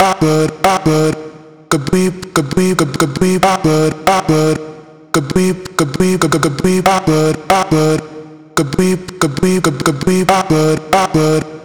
Vocal chop, 140 Eb; CutTheBeat; Slate Digital Omnivox Vocal Sample Pack; Chops

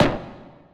percussion oneshot bang; Pop; Drums; SD PASADENA Pop Sample Pack; Slate

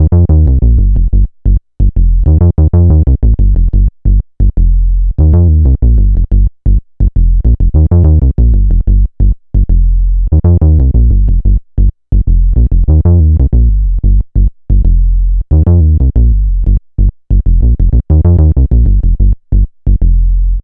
Synth loop 93 Fm, Synth Bass Loops; Slate Digital BASSHOLE Sample Pack

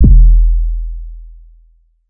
Synth oneshot Short Low E, Synth Bass One Shots; Slate Digital BASSHOLE Sample Pack

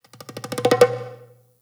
percussion one shot; Pop; Drums; SD PASADENA Pop Sample Pack; Slate

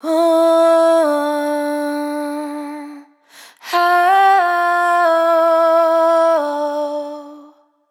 riff 90 Dm wet, Vocal; Slate Digital Omnivox Vocal Sample Pack

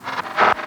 perc retrograde; Drums; One Shots; Slate; AURORA Sample Pack

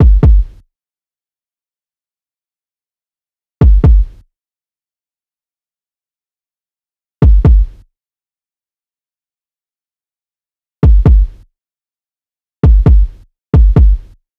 kick 133, Kick Loops; Drum Sample Pack; SD SEISMIC; Slate

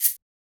hats, Pop; milkshake; Slate; SD PASADENA Pop Sample Pack

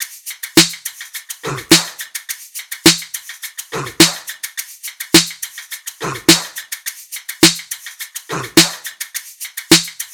tops 105, Drum Sample Pack, Loops, Top Loops; SD SEISMIC; Slate

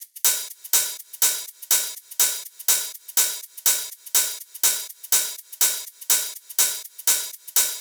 hats 123, Hat Loops; drum sample pack; Fogo; SEISMIC; Slate

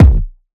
kick smoke; Drum Sample Pack; One Shots; Slate; SEISMIC

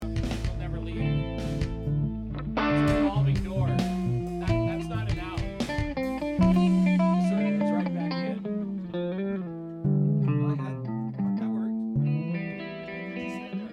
Strings Only

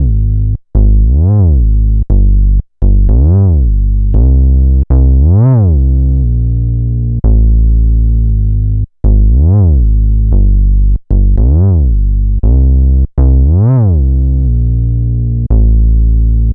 Synth loop 116 Ab, Synth Bass Loops; Slate Digital BASSHOLE Sample Pack

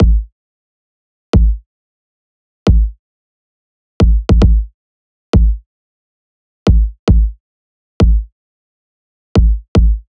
kick loop 90; Pop; Drums; Loops; Slate; SD PASADENA Pop Sample Pack

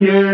vocal yeah, G; SD AURORA Sample Pack; Slate

